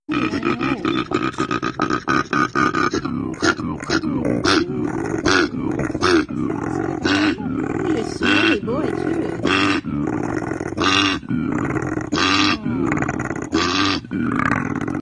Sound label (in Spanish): sonido del koala